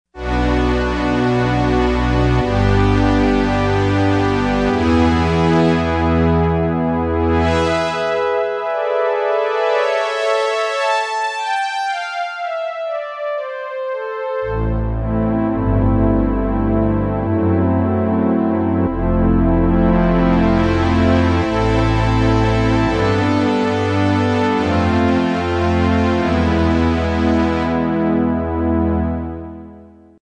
VCF String, Yamaha TG55; Factory Presets

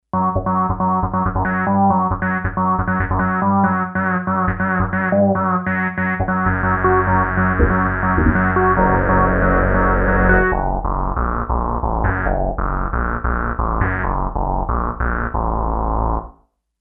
Raveish, Kawai K5000S factory presets

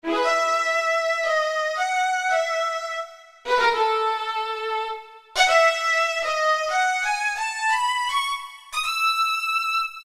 Viola; Roland PN-D50-01

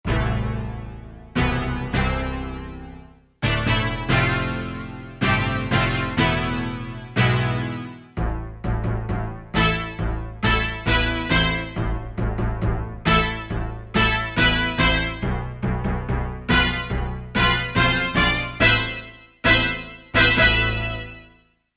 ORCHE LOW, Korg MSC-1S; Korg MSC-1S Variety

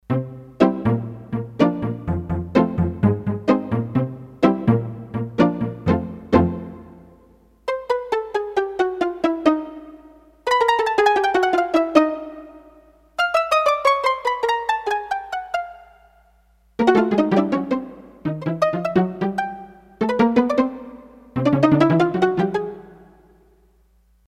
Pizzicato, Roland D-10; Factory Patches

